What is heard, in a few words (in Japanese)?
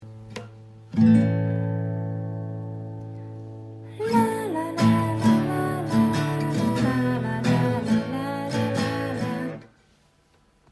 コード A 歌